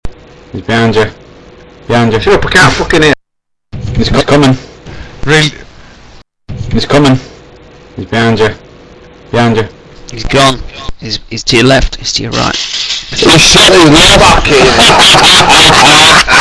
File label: camper windup